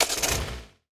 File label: car engine start; factorio 2.0.55